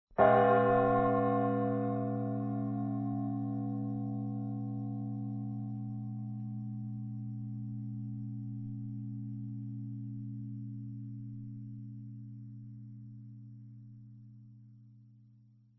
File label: russian bells; zvons